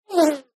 animal insect bee wasp fly by

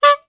cartoon horn hooter honk single; zapsplat

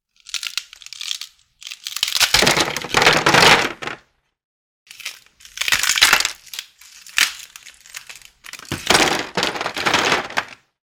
household removing ice cubes from tray; sound effects one

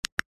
technology plastic radio button single press; zapsplat

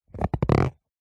rubber short rub creak; foley; zapsplat

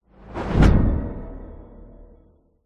cinematic whoosh fast into drone dark impact; sound design

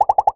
cartoon pops fire quick succession

